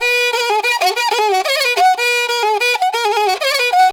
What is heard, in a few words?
riti grooves; Best Service; World Colours